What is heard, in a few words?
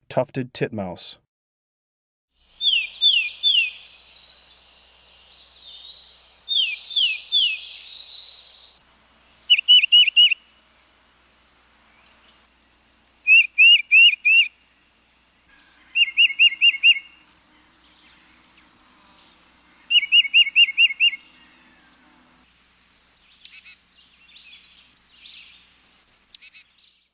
Tufted Titmouse, Birds of the Chicago Region